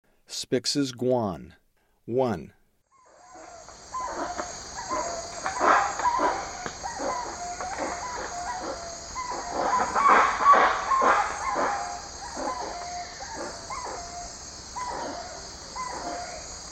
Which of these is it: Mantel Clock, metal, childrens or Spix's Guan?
Spix's Guan